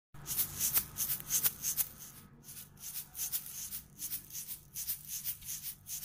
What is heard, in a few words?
shaker; بررسی و آموزش ساز شیکر